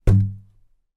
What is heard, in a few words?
foley balloon foil helium bounce ceiling single